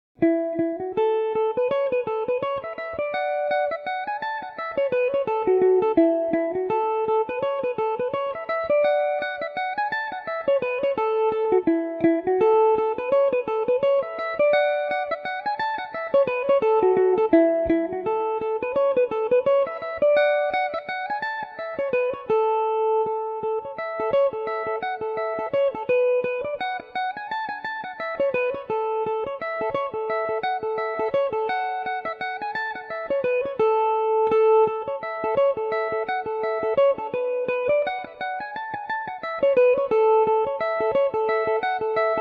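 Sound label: A major, irish mandolin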